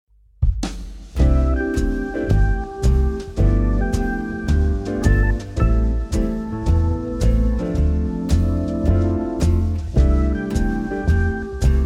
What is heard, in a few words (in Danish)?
C med melodi; akkorder